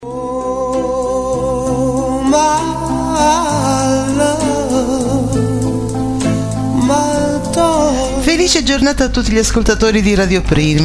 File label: jingle; musicando pina bombara x radio prima